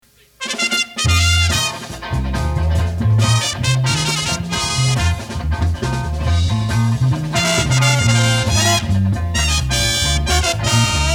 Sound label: Deep Brass Break Time Mono